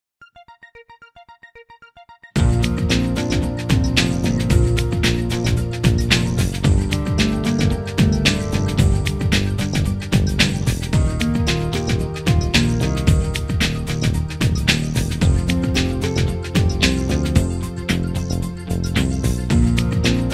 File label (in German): trompetentaxi